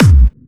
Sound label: Clubby Kicks, Bassdrums